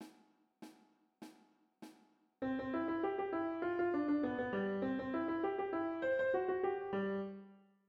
LA SURPRISE en doubles croches

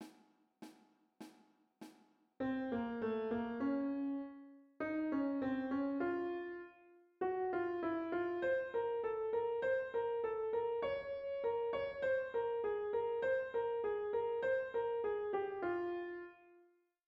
MARCHE TURQUE en croches

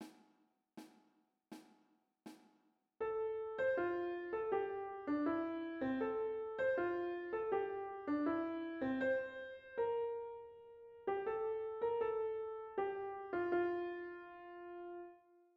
AIR en sautes

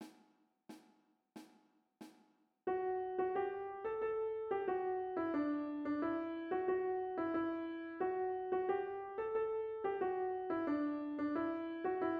ODE A LA JOIE en sautes

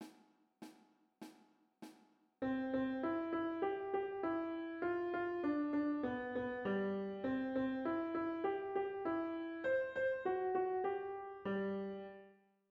LA SURPRISE en croches

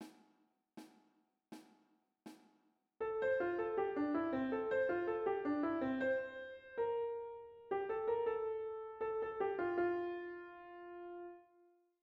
AIR en doubles croches

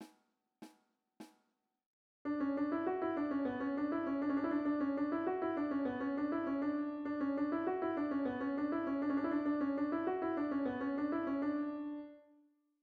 FARANDOLE en doubles croches

